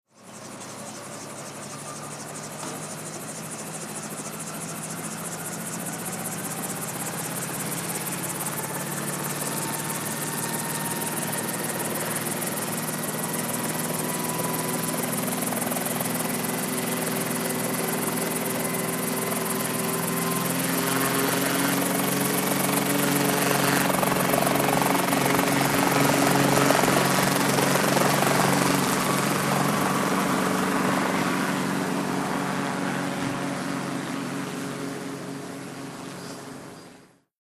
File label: Heli Trffc Apprch Lan, traffic